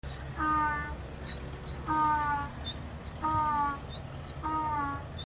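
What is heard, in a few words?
donkey; egypt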